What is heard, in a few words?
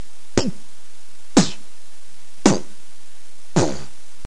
kicks